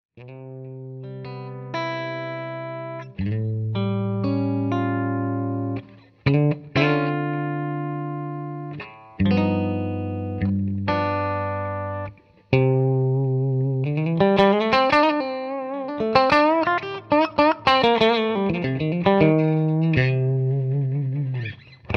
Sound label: Clean Strat open back cabinet; treble 13